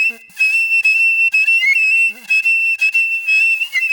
Club Flute

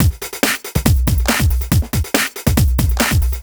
Break Beats, 140 BPM

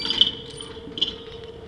MACHINES

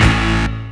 chord A; Techno Trance Essentials; CHORDS

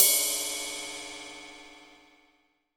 18 OLD, 18INCH OLD ZIL RIDE

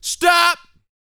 VOX, Vocal, R&B Dance, HIP-HOP; Spectrasonics Vocal Planet

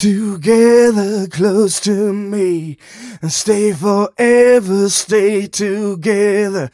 130 mel g, Vocals

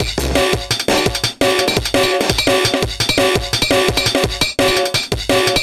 Jungle Bell Beat; Drum n Bass; Loops; Beats and Percussion; KJ Sawka; Drum n Bass & Beyond

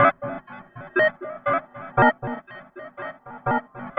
Tape Arp; Sonic Foundry (Sony Creative Software); Ma Ja Le Ethereal Textures

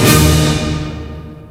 HIT, ORCHESTRA HIT; Crypton - DrumBase5000

